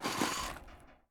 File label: prone metal; Escape from Tarkov